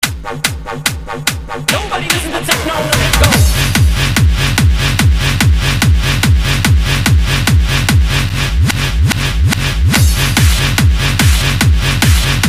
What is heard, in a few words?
Techno